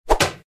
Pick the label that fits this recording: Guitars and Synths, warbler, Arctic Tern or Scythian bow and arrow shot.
Scythian bow and arrow shot